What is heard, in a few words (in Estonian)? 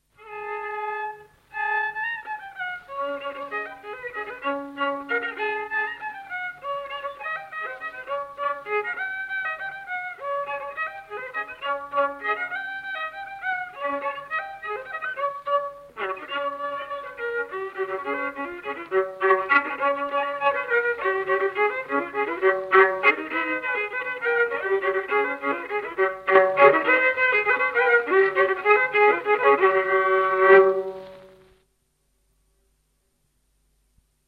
Tarvastu viiul folk; Tata luuli; Johan Room